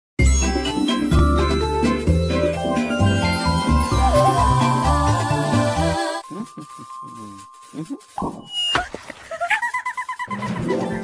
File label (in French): habillage; tps cine comedy